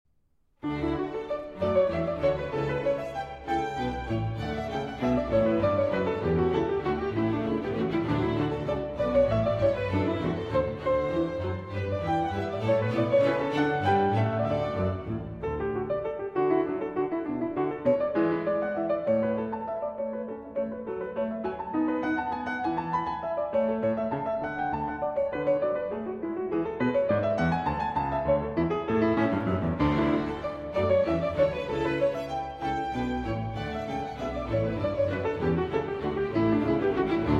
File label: Keyboard Concerto in D Major, Allegro; BWV 1054 III.